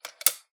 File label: coins handling